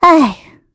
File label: Vox Sigh; Estelle; kingsraid